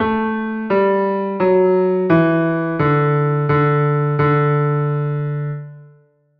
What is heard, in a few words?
cello example